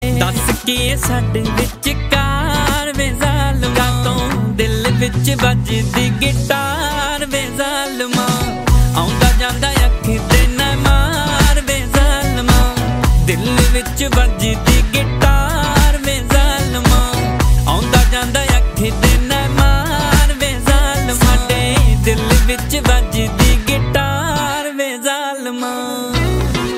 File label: guitar ringtone